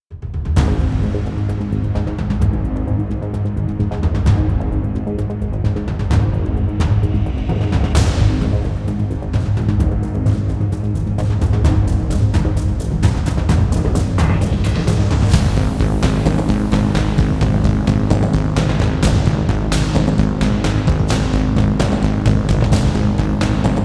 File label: Warning; Fatal Twelve